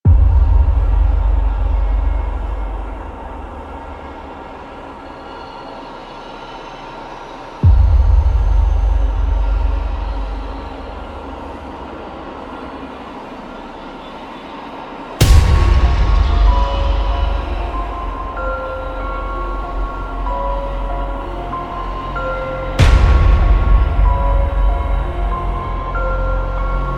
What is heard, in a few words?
epic intense creepy horror music